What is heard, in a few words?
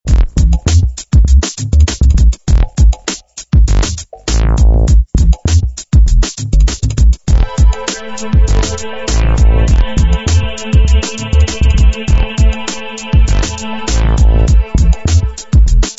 Bass Race